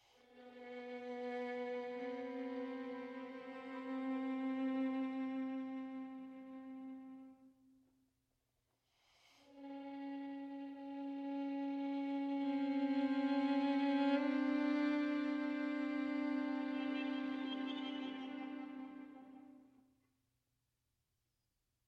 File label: string quartet; string quartet no. 4; icarus in flight